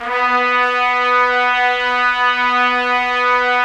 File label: Trumpets; Roland - LA Composer vol. 4